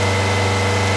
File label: Saturn 2500, Engine; Cars